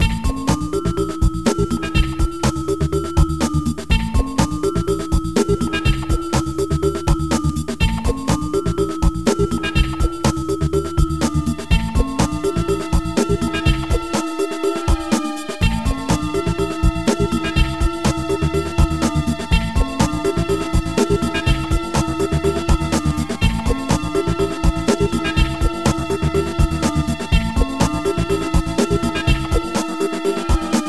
Upbeat

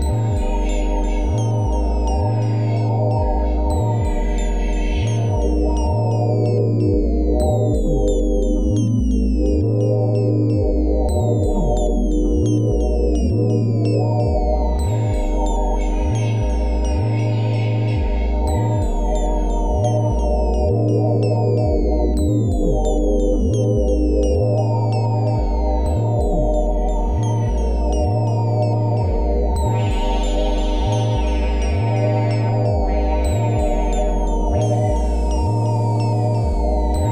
Ambient

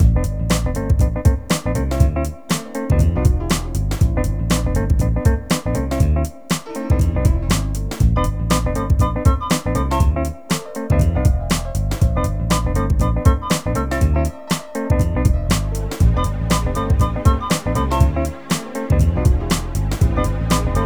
Corporate

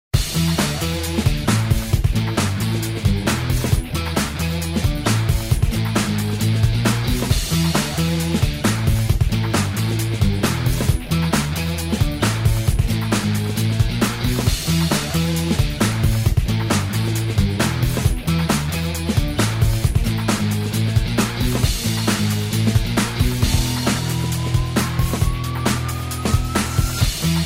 Rock

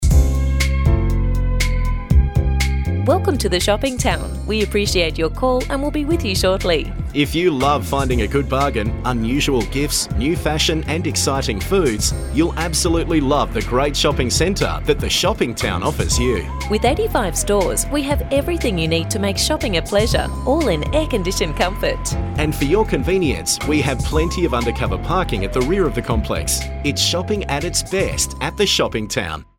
Corporate

